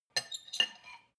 cutlery on plate clatter and scrape, gastronomie; berufe; soundpool

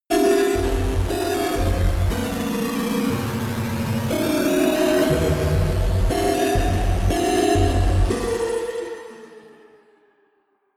danger synth, sciene-fiction

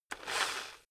rock drill rev low short; maschinen; bohrer

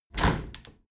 window handle squeak turn open halfway heavy; objekte; fenster

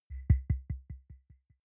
button echo beep bass; maschinen; signale

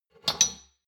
shot glasses pull out slide medium, gastronomie; berufe; soundpool

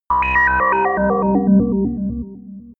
sci-fi dark planet, digital

